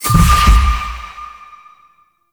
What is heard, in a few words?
Minigame Ring Success; Birth Minigame; Warframe; Jade Shadows